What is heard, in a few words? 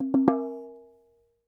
CLAY, CAMEROON; AKAI S6000 CD-ROM; WorldSounds